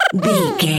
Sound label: goblin fairy expression emote